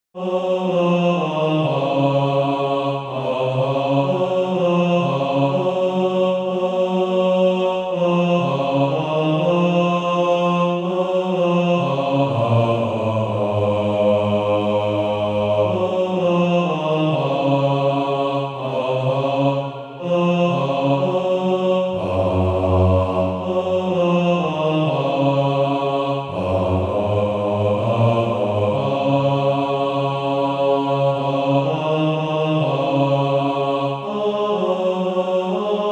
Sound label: bas